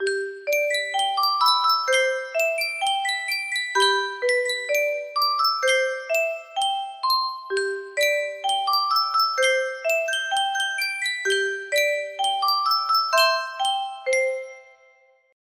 yunsheng music box

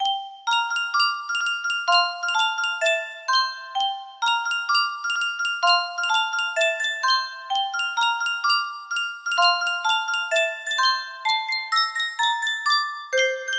music box

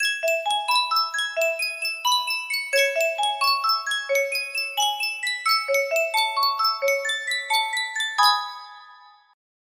Sankyo miniature music box

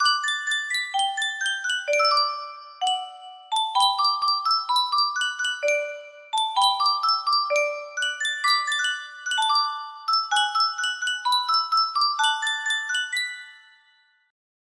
custom tune music box, music box melody

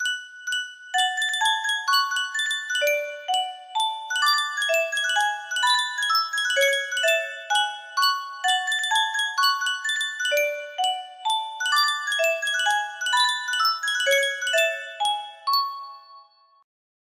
Yunsheng music box

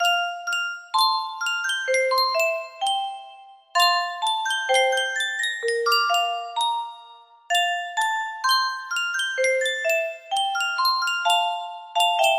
Yunsheng custom tune music box

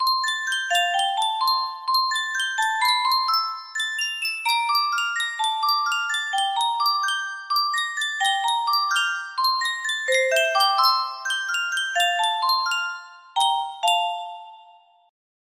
Yunsheng music box, melody; final fantasy vii

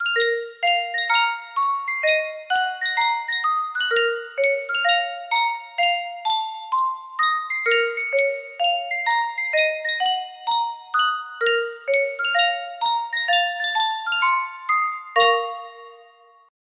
Yunsheng custom tune music box

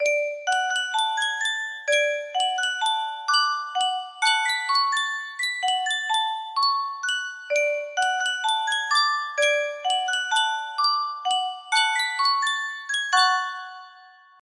yunsheng music box, melody

